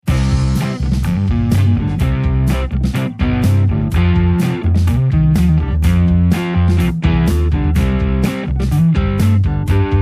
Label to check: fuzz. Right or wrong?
Right